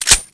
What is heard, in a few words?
glock slide release